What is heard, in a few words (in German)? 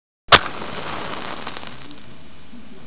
streichholz